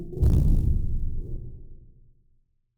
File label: Fart